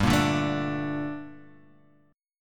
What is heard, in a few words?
Bb Major 7th, G; Bbmaj7/G; strummed; JGuitar